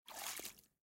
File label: step in water; out of reach treasure royale 2020 gamerip pc